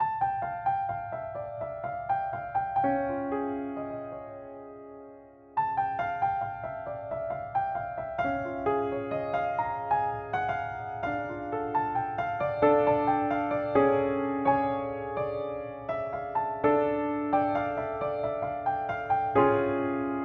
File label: Piano Dramatic